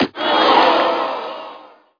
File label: awww